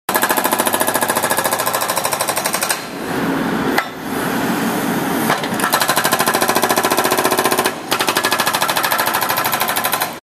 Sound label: jackhammer